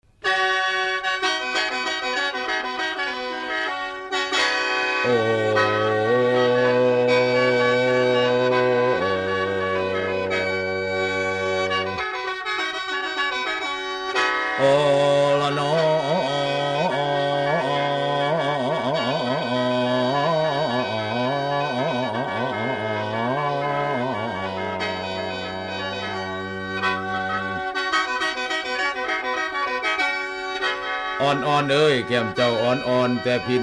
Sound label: Thai Lam Klawn